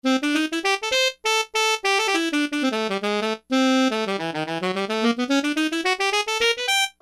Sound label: Alto Sax; E-mu Proteus-1; Presets